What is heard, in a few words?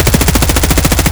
hk416 outdoor close loop; Escape from Tarkov